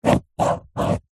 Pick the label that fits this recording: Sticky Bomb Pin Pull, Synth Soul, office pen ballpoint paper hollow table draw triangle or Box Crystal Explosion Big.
office pen ballpoint paper hollow table draw triangle